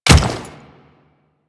Default Step, Footsteps; Warframe; Grineer Royal Guard

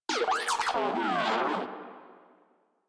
Moa Compassionate Vocal Death; Warframe; Pets

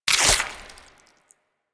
Alchemy Lab Chair Cyst Remove, UI; Warframe; Liset

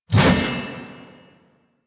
Shield Whoosh; Warframe; Duviri; Duviri Dax; Weapons; Duviri Mace And Shield

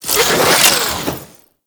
Necramech Repair Drone Left; Enemies; Warframe

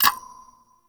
Sticky Bomb Detonate; Grineer; Veilbreaker

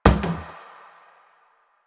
Plastic Hit Short

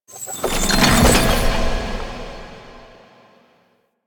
Bridge Form; Warframe; Cephalon Weave

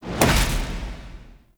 Fly Inner Depths Detach Land D; Sentient Devourer; Sentient Spawn Outdoors

